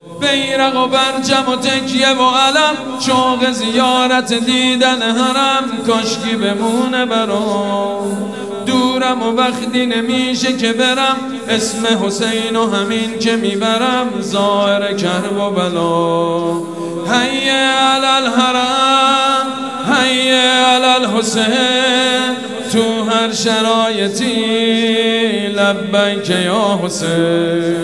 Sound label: Islamic ringtone